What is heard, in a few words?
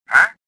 confuse; worker3